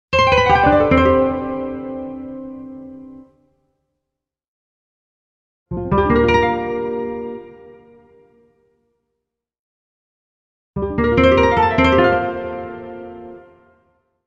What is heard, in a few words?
Synthesized guitar pluck; Music logo; Hollywood Edge Sound Designers Tool Kit